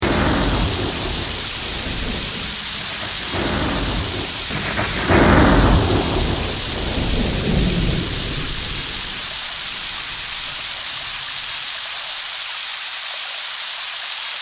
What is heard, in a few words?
sounds of nature